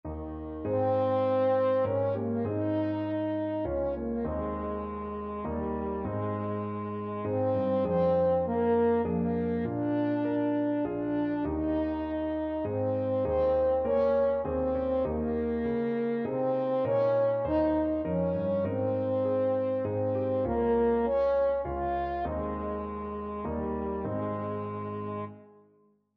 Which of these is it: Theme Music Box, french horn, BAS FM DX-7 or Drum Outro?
french horn